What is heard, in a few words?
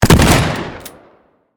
shotgun